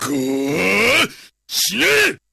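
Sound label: best attack; iori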